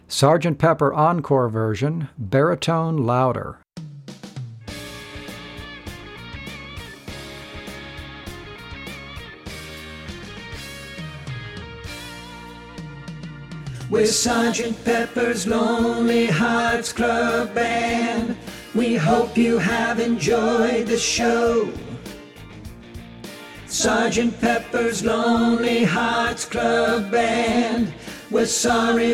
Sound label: BARI LOUDER; practice tracks for singers